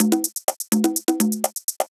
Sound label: 80s Perc Loop 125, Old Skool House